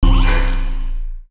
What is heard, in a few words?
sci-fi mechanical hiss